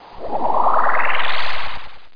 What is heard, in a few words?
SPELL; THEREALM